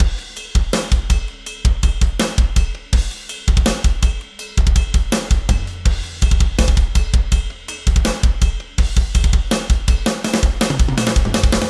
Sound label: Main Riff Drums With Extra Samples, modern metalcore